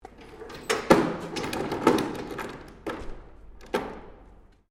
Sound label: DUMPSTER METAL LID PLASTIC OPEN, Large metal industrial dumpster; Factories